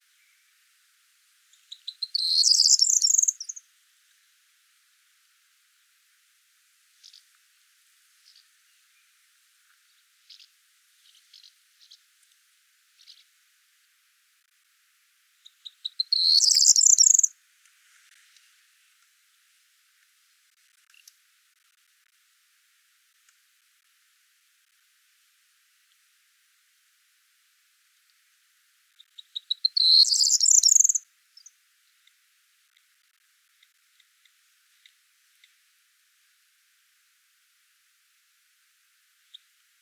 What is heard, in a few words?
Corn Bunting